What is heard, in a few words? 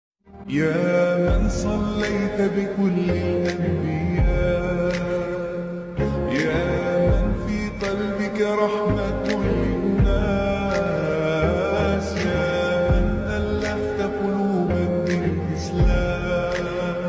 arabic